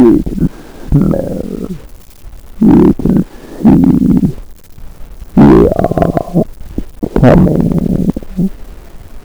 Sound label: alien